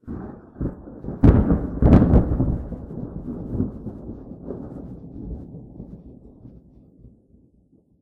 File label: thunder; weather